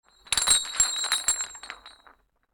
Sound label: Large Chains Underlay